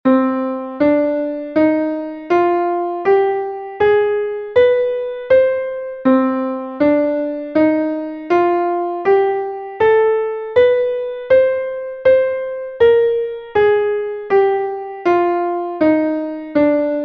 scale of c harmonic; Essentials of Music Theory: Elementary

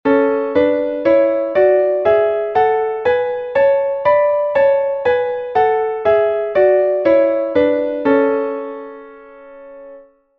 sixths; Essentials of Music Theory: Elementary

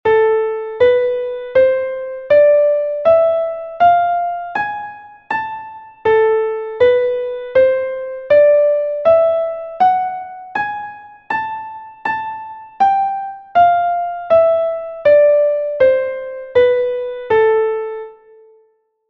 scale of a harmonic; Essentials of Music Theory: Elementary

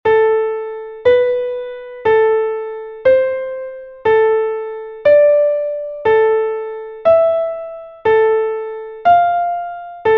four intervals harmonic minor scale; Essentials of Music Theory: Elementary

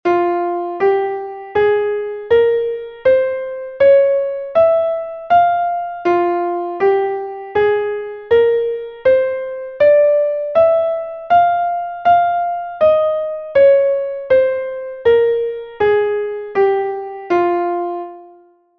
scale of f harmonic; Essentials of Music Theory: Elementary

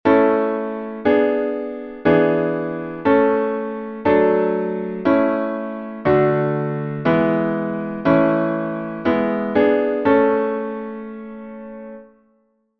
dominant seventh chord a; Essentials of Music Theory: Elementary

